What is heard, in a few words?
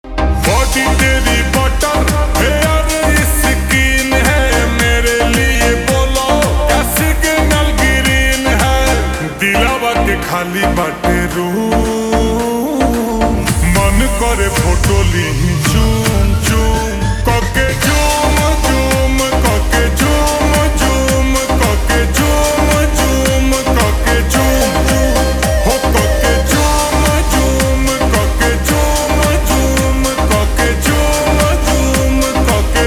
Slowed Reverb